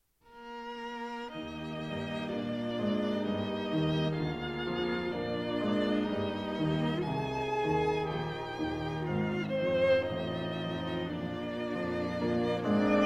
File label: Piano Quartet in G Minor; No. 1; Op. 25; Brahms